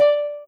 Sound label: soft hitwhistle; osu